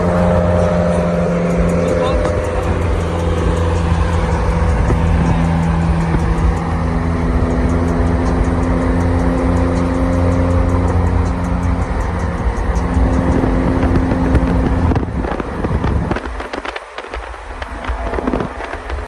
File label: mitsubishi; اگزوز